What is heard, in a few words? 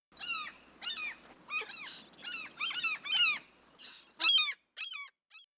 gull; Seer; GamigoNA